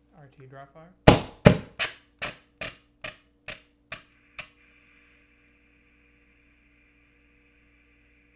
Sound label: automag out of gas raw, guns; automag rt